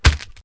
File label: bod strk, bugs; Starship Troopers